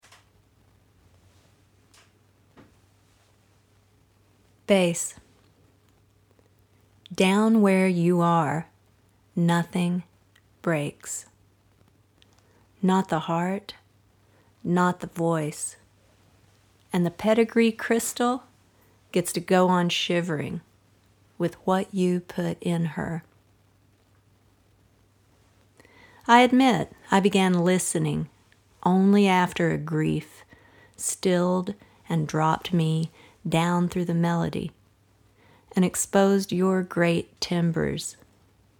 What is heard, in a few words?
Bass